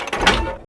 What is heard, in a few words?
exit transport; Red Alert; RA2 Allied; Desktop Themes